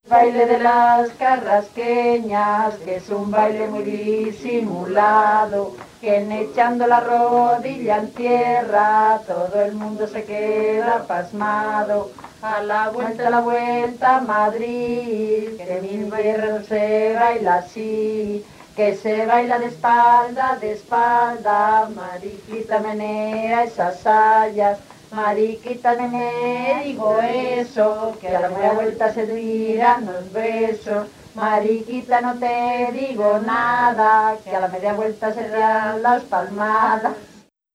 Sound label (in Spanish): Las carrasquillas, Castromonte